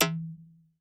Perc Reso, Vermona Mono15 Drums

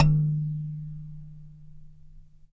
Dyna Sansa; Roland LCDP11 Africa VOL-1; Sansas

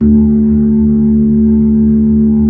B-3

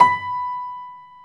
PIA GRS, PIA_GRAND, Roland

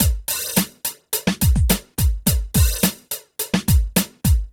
PHATT BEA

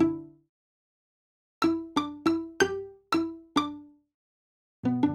strings, analog funk; Mixed Berry Jam; Sonic Foundry (Sony Creative Software) - What it Is! 70s Analog Funk

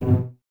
CES B Pizz, Cellos; Miroslav Vitous; String Ensembles

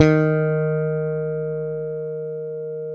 SPC SUS F D#3, Bass; Sampleheads; Will Lee Bass Library

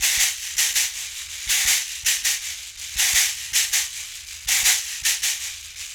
Berimbau Shaker; World Percussion

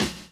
LOOP; X-Static Goldmine 2

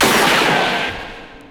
g#2, Special FX, CASIO FZ-1; Retro Sampler

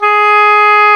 oboe, Oboe Brth

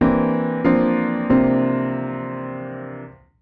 Jazz Keys Ac Piano 128 C, Chordy Loops, 128bpm

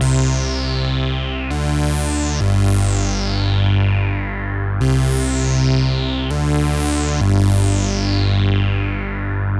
G Bass Zap, 100; Zero-G; Future Wave

